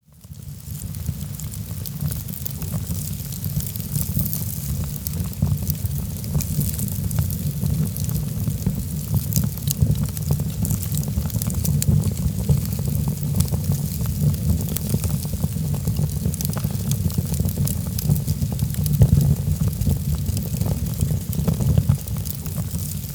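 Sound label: Pech ogon, stove fire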